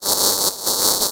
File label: Science Fiction Sci-Fi Electronic Electrical Swell